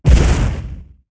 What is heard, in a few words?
magnum break; classicro